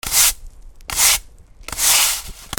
HOUSE

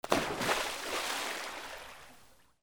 DOGS

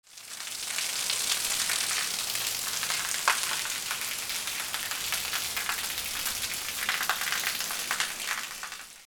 RAIN

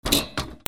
GARAGE

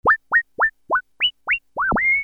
SCIFI

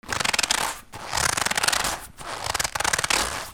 FRICTION

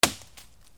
FALLING

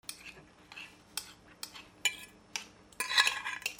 KITCHEN

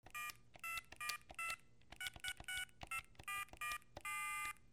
PHONES

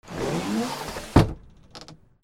DOORS

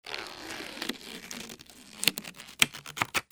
TOYS

